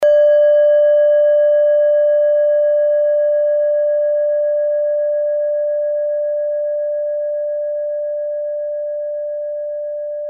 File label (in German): klangschale set; Klangschalen-Chakraset; Gewicht = 320g; Durchmesser = 10,7cm; Höhe = 5,0cm